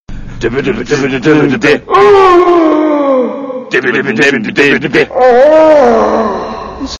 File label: electro, hip hop ringtone